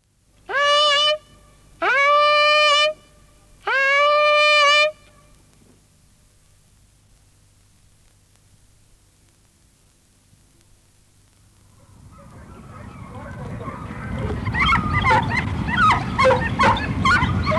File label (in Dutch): vossenjacht